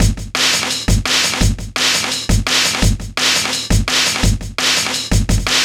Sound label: Rough Beat, Drum & Bass; Fluid Dynamics